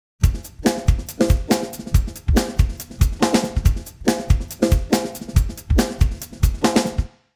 drums 75; timestretch; prosoniq time factory clear scale

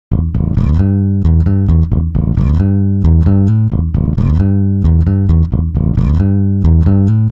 bass 75; timestretch

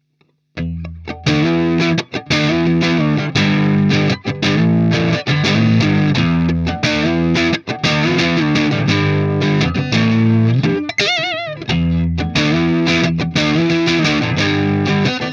duosonic satu; squier; brunetti

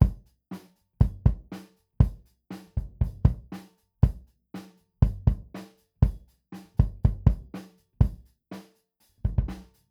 kick gretsch front, drums; crowley and tripp el diablo

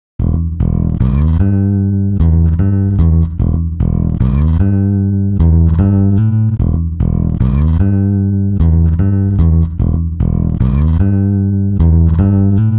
bass 133; timestretch; ikm sample tank ps ts

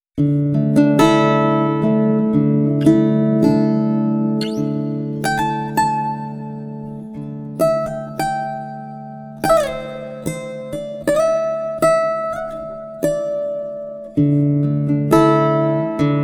guitar, pitchshift

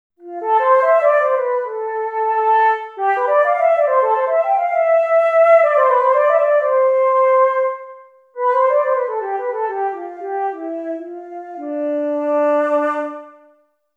flut; wallander instruments; wivi player

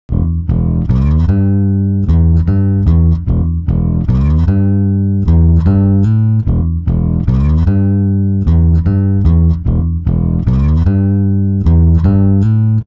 bass, time stretch; prosoniq time factory clear scale

